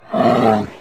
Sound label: bear pain